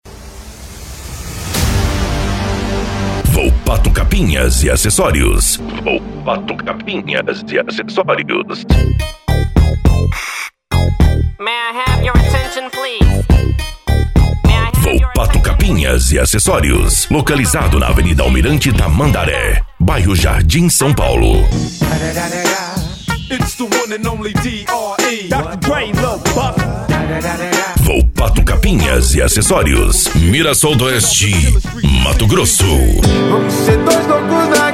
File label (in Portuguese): funk consciente e hip hop